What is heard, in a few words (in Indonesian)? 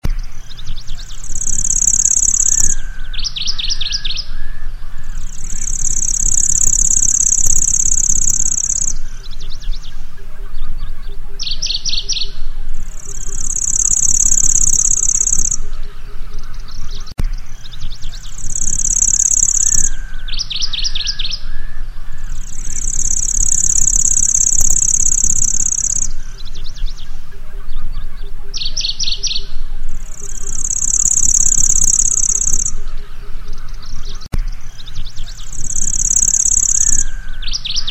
suara kicauan dan panggilan kecici lurik